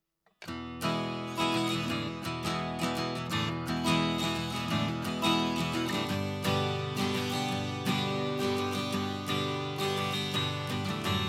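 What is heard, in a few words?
Guitar MD421; Digi002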